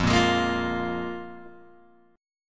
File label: F Minor Double Flat 5th, Guitar, Open G